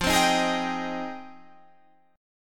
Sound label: F Minor 9th F